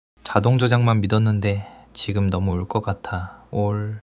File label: crying